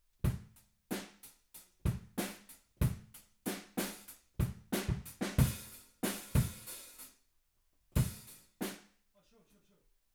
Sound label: Room